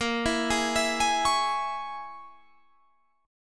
A sharp Minor 7th Flat 5th A sharp, Guitar Drop D

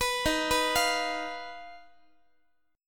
D sharp Suspended 2nd Sharp 5th D sharp Guitar; arpeggiated; JGuitar

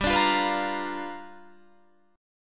G 7th Sharp 9th G, Guitar Half Step Down

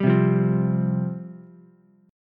C Suspended 4th C, Ukulele; Csus4 strummed; 0,0,1,3; JGuitar

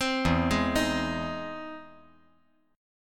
E Augmented Major 7th E down

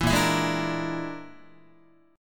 A sharp 7th Flat 5th, Guitar chord; A#7b5 strummed; JGuitar

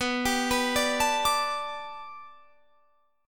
B Minor 7th Sharp 5th B Guitar Standard, chord; Bm7#5; arpeggiated; 19,22,21,19,22,22; JGuitar

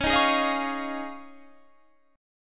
Eb 9th Suspended 4th

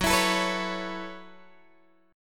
Gb Minor 11th Gb down Guitar Open G

